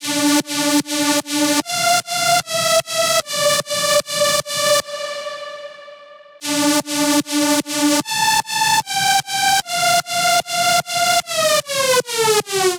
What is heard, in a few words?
Synth Loop, 150BPM, D